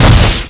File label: gun